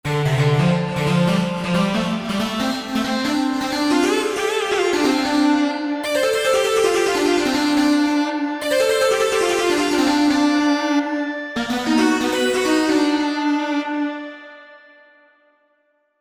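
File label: Pulse Lead, Korg MSC-1S; Korg MSC-1S Variety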